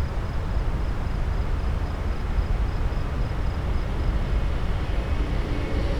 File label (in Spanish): sonido coche con naturaleza